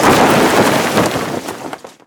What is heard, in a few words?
cloth long